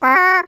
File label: piano d; Angry Birds